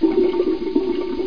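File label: down drain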